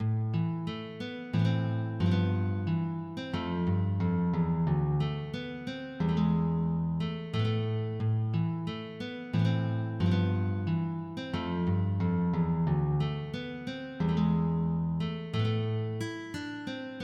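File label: Chaconne transposée du Théorbe, MIDI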